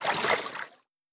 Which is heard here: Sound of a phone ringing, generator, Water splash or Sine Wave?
Water splash